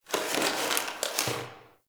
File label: Box Of Trash Rustle; Warframe; Nineteen Ninety Nine